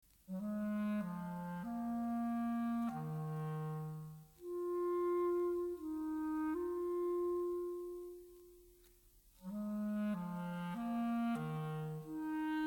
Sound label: clarinet; Stravinsky